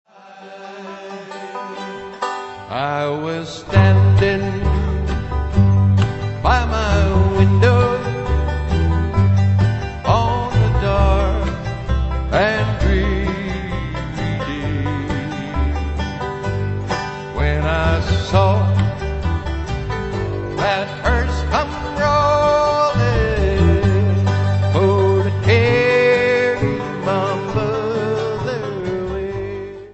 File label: bluegrass medley